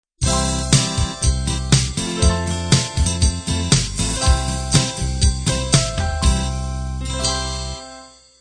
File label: bubble gum end